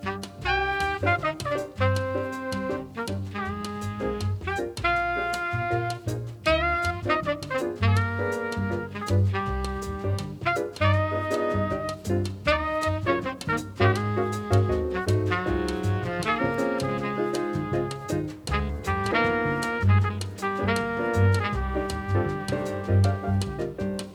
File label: melody